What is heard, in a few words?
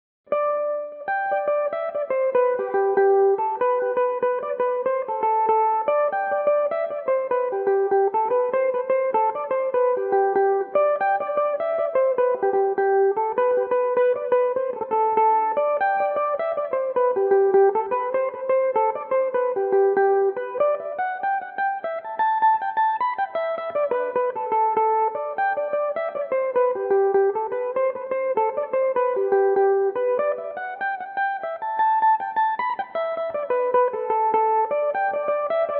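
G major belmuse, irish mandolin; The Humours Of Glynn (jig in G Major); Belmuse EM-190 electric mandolin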